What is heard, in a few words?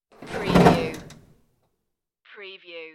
open locker door